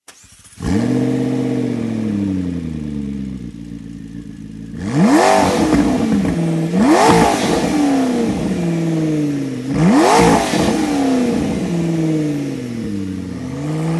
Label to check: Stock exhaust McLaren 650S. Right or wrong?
Right